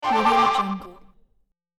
fluttery ambient video game confirmation menu sound